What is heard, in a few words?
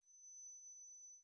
trumpet